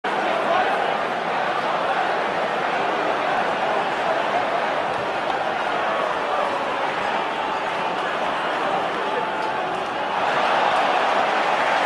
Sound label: football sound effects